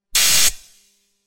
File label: lightsaber